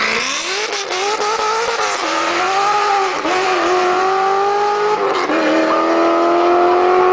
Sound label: Ls1 Vette Launch